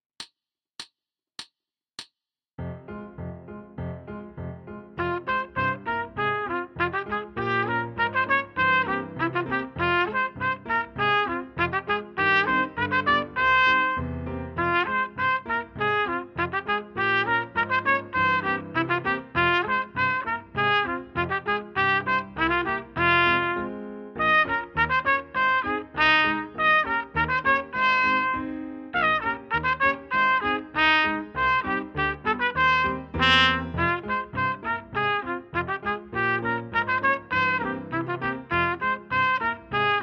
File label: Solo with Acompiment, Baritone; Solo Accompaniments